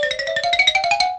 xylophone piling up

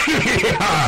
hihihiha

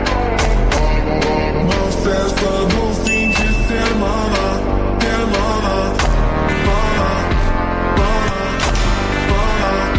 cool background music

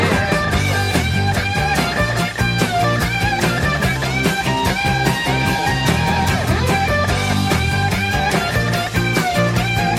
solo

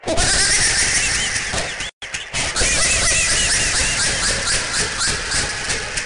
baby laughing; meme

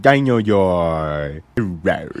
dinosaur rawr

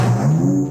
text bounce; deltarune

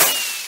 firework; minecraft

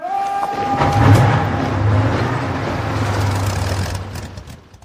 war declared; hoi4

